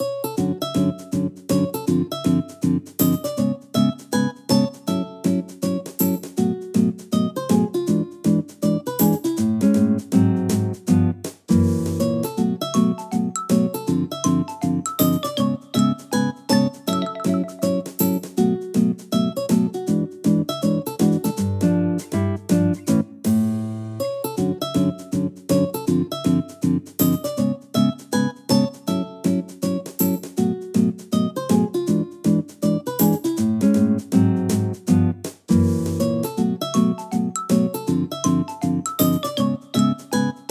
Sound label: Seaside Relaxation; miitopia